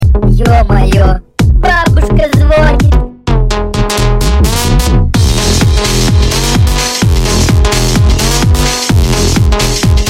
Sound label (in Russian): babushka zvonit tecktonic mix